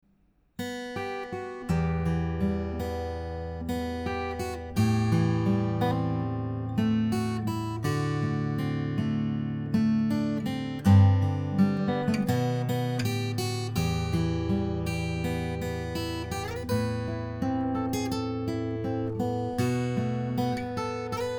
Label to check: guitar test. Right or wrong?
Right